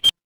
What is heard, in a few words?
ui beep